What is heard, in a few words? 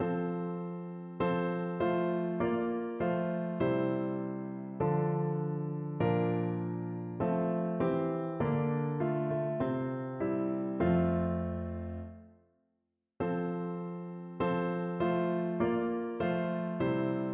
organ